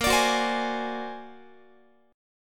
Bb Augmented 9th Bb down Guitar; x,18,17,16,16,19; 4A,4E,5A,5D,5Gsharp,6Csharp; Bb+9 strummed; JGuitar

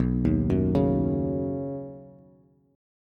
B Major Add 9th B Bass; Badd9; arpeggiated; JGuitar

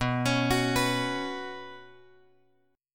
B Suspended 2nd; Bsus2 Chord {7 X X 6 7 7} arpeggiated

